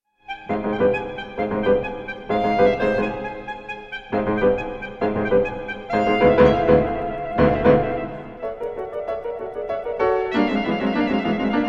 concertino for piano 2 violins viola clarinet horn and bassoon